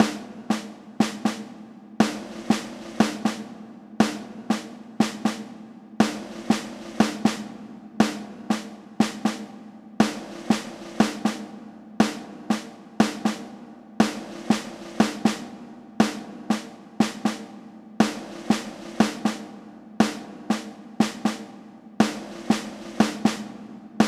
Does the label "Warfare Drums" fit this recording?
Yes